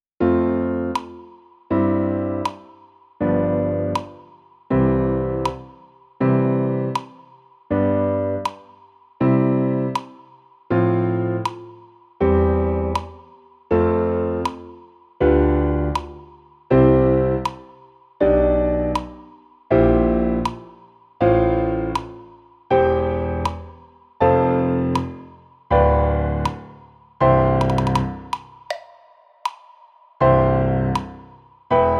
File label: C Major Scale